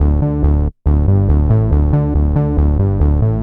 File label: Bass-D; Techno; TechnoMusicMaker